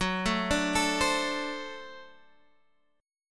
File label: D 7th Gb, guitar